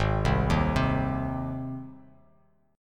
G sharp Major G sharp Bass Standard, chord; G# arpeggiated; 4,3,1,5; JGuitar

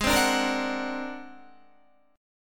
Ab 9th Flat 5th Ab down Guitar; Ab9b5 strummed

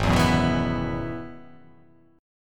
Ab Minor 7th Sharp 5th, chord; strummed

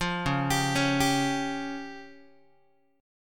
C sharp Major Flat 5th, Guitar; C#Mb5 arpeggiated; JGuitar

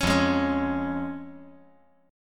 F Minor Sharp 5th, down

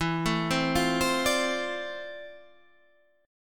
D-Minor 7th, E; Dm7/E; arpeggiated

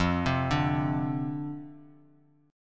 F 5th 6th F, chord; arpeggiated

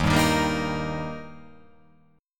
D sharp Major D sharp, chord

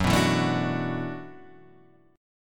E 7th Suspended 2nd Suspended 4th, F sharp, chord

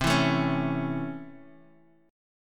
C sharp Minor Major 7th Sharp 5th C, chord

